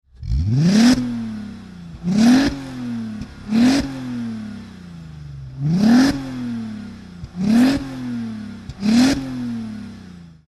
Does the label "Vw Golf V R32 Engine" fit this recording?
Yes